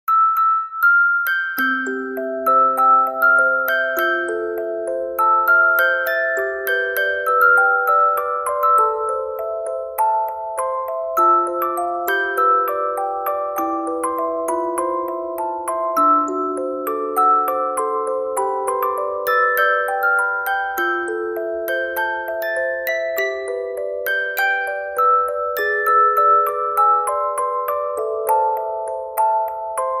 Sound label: music box; Phoenix